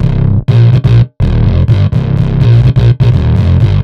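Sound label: Raw, bass, big beat; бас сэмпл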